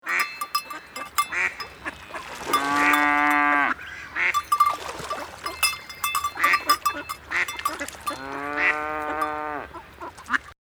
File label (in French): duo vache canards; loto sonore; loto des animaux